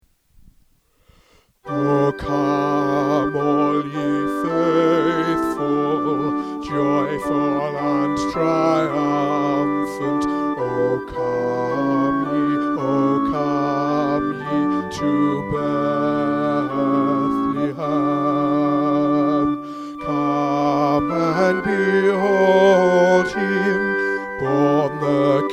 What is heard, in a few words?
Alto; O Come All Ye Faithful